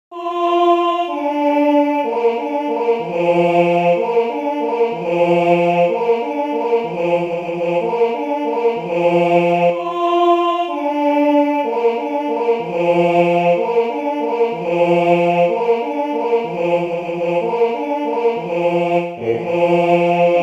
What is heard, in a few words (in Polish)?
Jelen na rozkladzie, sygnaly mysliwskie; sygnaly mysliwskie ze slowami